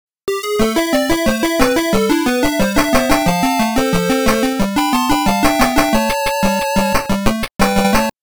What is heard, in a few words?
Game Over (Game Gear Version)